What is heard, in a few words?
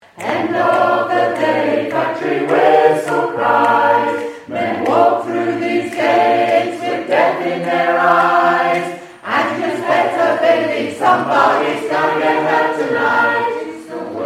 factory you better believe